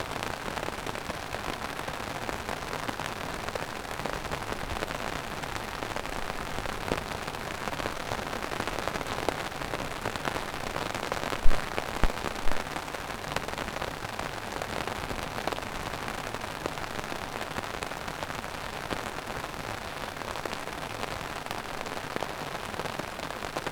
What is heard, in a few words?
intense but gentle steady